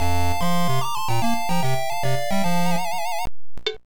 Bonus Game Lose, Time Out; magical crystals

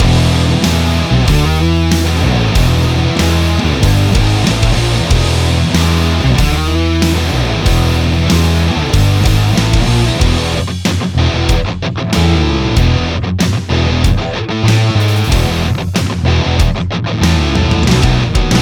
Survival Theme Loopable; Heavy Impact VR

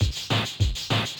Godmode Remix Drums; Selene

